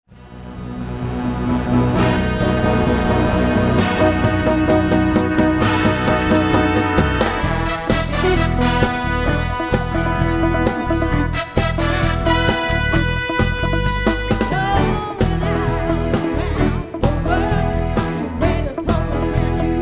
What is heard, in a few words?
live mix